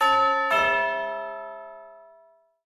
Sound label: Clock Tower Place; Towers